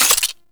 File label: Sniper Zoom In; DE; Warframe; Frumentarius; Exalted Sniper